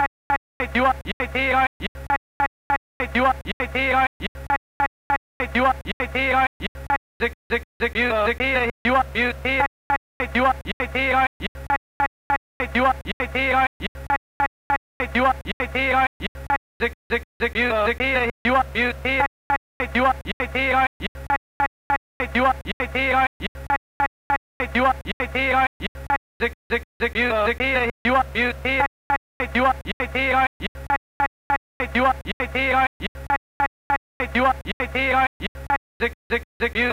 100 vx chop loop

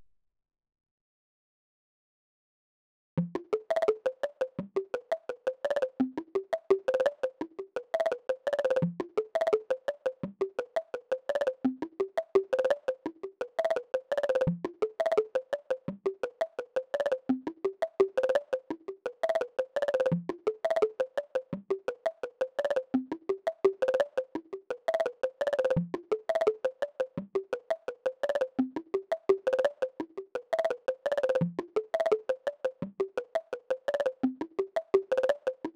bongo

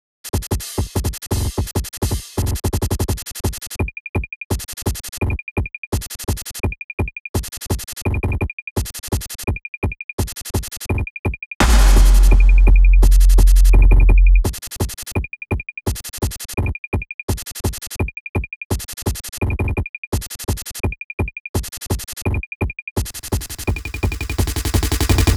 drums

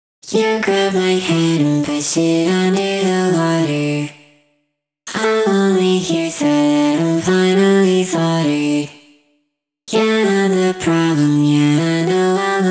vox

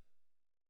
snare

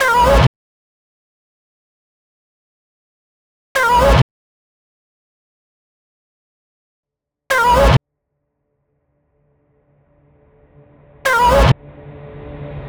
128bpm Meow

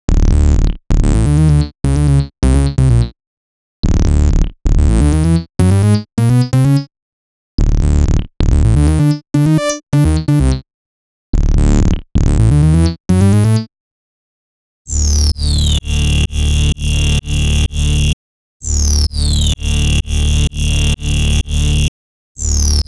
128bpm Bass

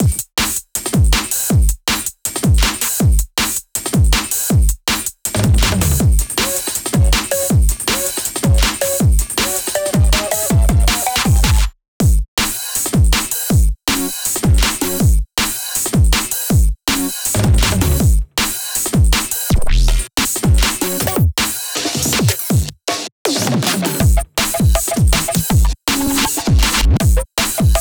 drums bus

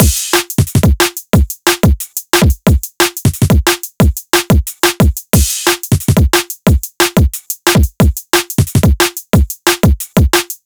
dnb beat

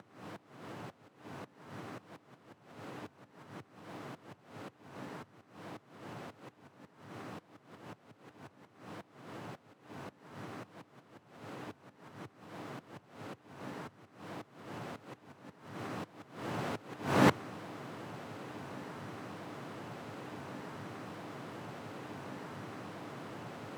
white noise sidechain

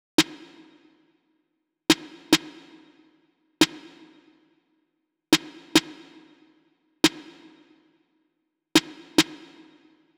snare

